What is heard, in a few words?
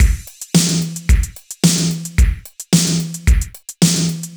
Drums Before Bounce